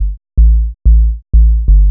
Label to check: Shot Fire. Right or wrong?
Wrong